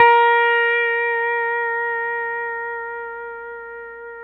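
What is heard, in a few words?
pedal steel A#4 left; PEDSTL NOTES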